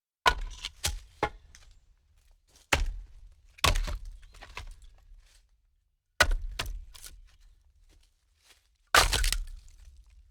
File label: stacking logs